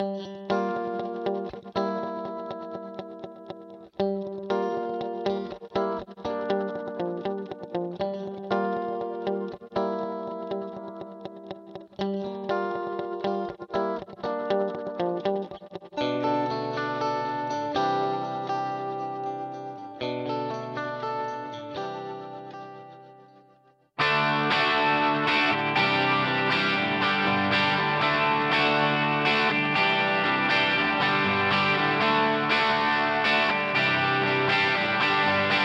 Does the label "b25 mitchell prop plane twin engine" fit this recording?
No